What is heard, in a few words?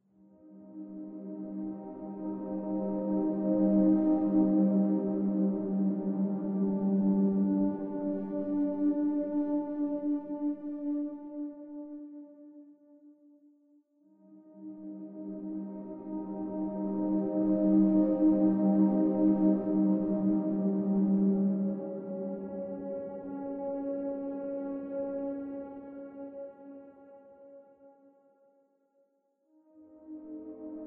Drone